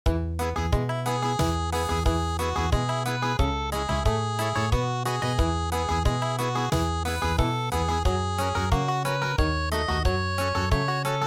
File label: Country